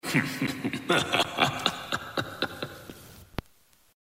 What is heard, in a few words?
Evil Laugh; Castiel